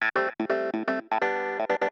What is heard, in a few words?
SYNTH HOUSE 125 A; eJay; Keys